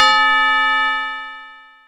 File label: TUBELL MID, TUBULR; GONGS+TUBULR